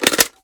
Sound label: inspect drum drum in; reloads; gmod